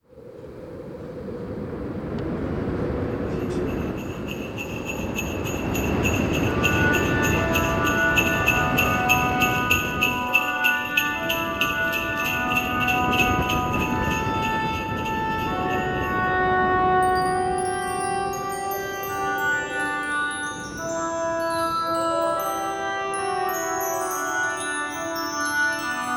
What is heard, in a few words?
Christmas Background